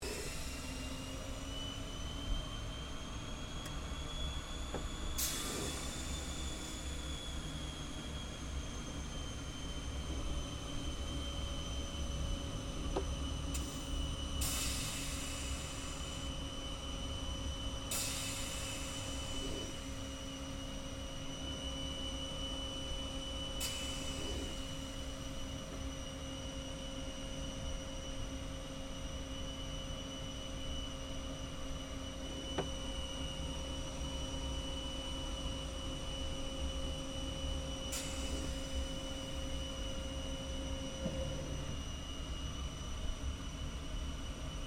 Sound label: AIRCRAFT GLOSTER METEOR F MK8 TAXI INTERIOR, Gloster Meteor fighter jet; Airplanes; Vehicles